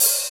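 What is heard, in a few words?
hi-hat, hi-hat real; Sound & Vision - Gigapack I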